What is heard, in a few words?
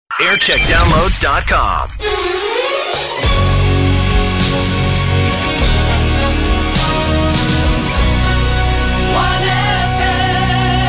Chart Show Bed; Radio 1; JAM